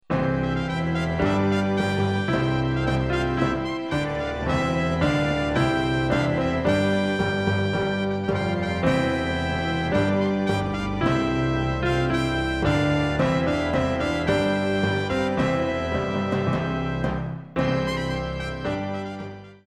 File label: Trumpet Voluntary